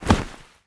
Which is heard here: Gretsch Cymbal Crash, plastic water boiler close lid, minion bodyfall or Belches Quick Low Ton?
minion bodyfall